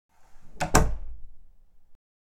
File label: Door Closing